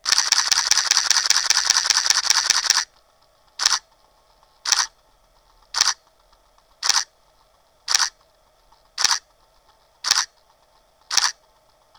continuous hi RAW, Pentax K-7

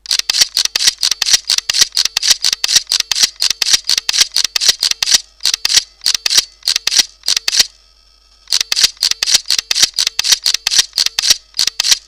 continous hi JPEG, Pentax K-m

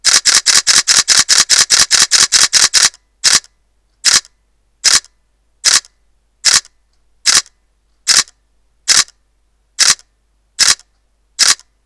Alpha DSLR-A550 continuous hi RAW; Sony

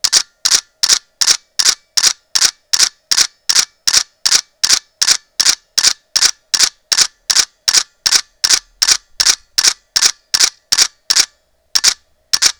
D300s continuous H 14bit RAW; Nikon

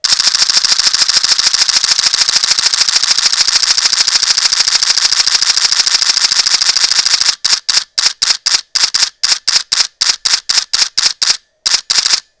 Nikon D300s continuous H; JPEG

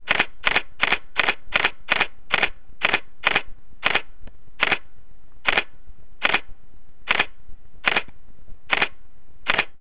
raw, Sony Alpha DSLR-A200

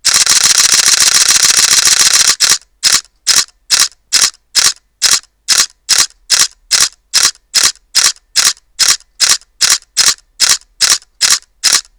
Alpha DSLR-A550 continuous hi JPEG; Sony

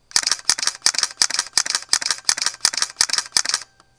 continous, Konica Minolta Dynax 5D

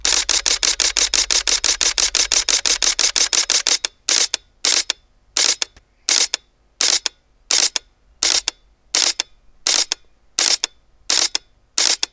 AlphaSLT-A55 continuous hi RAW; Sony

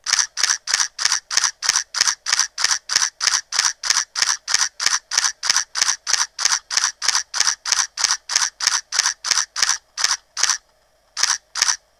continuous lo JPEG, Pentax K-7

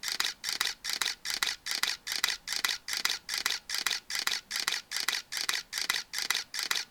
shutter quiet; Canon EOS-100D

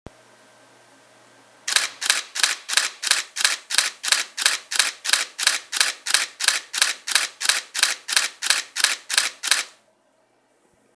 d750 quiet burst; Nikon D750

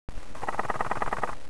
sensor cleaning

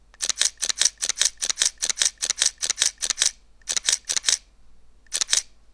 continous, Samsung GX-1S

